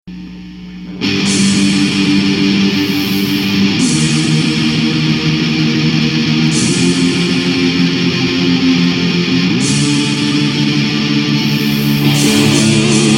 guitar

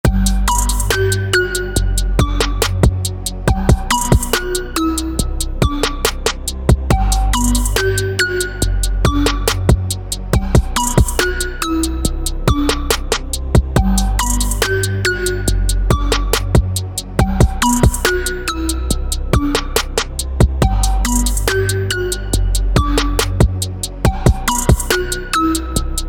hip hop loop

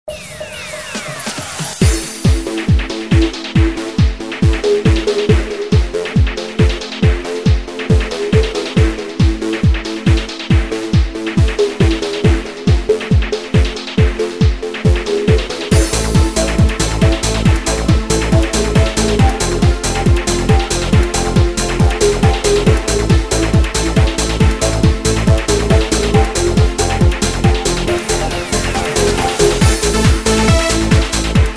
romance dance

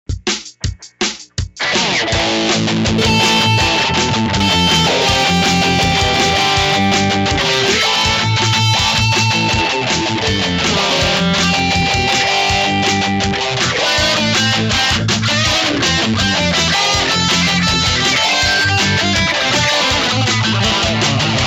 solo

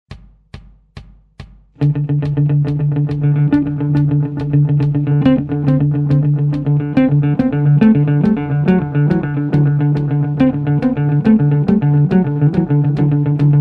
cosmic echo, stardust pedals

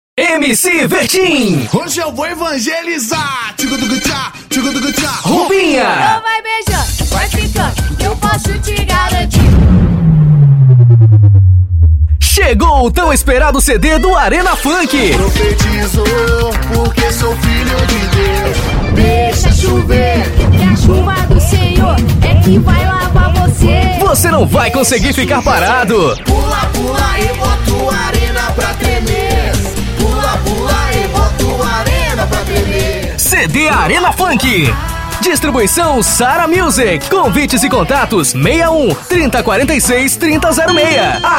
funk

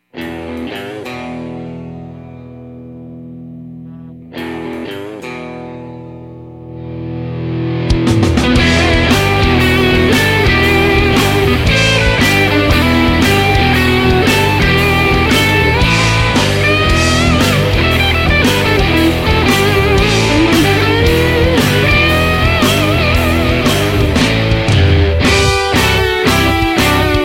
west blues

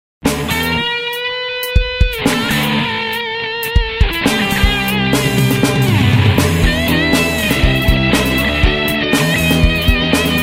guitar

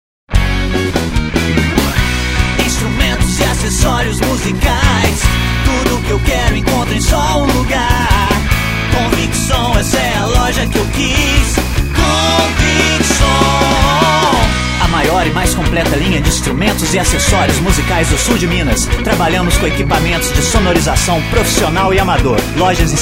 guitar jingle

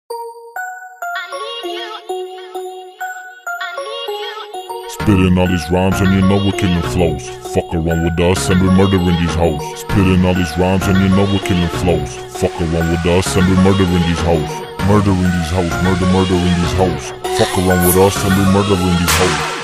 diss

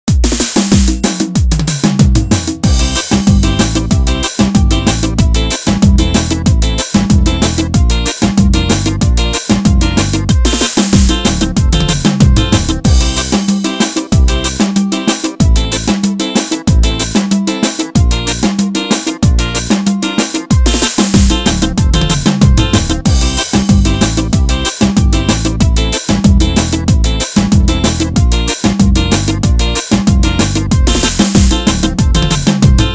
forro xinela dance

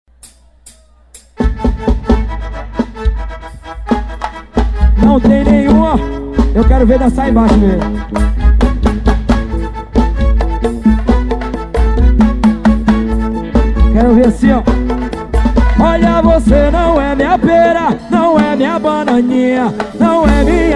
swing

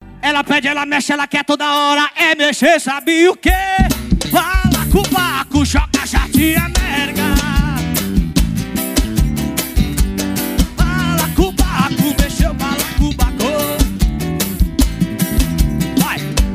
banda ao vivo

